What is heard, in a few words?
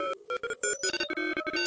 short morse, filtered morsecode like